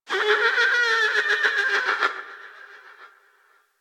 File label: Forest Distant Medium Creature Small Call; Warframe; Grineer Forest